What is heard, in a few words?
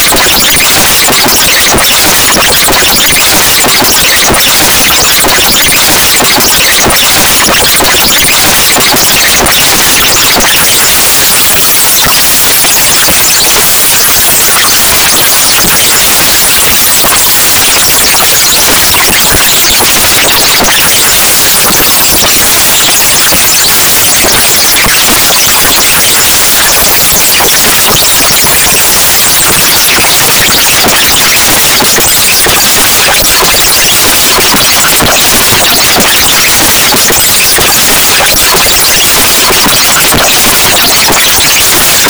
silence